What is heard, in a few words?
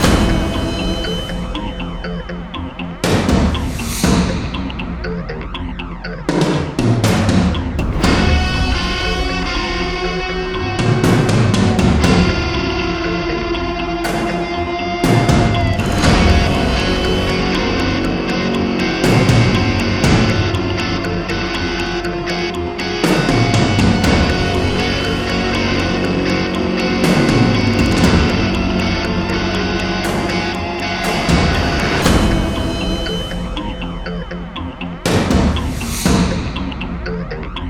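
music, percussion loop; ACTIVATING TRYP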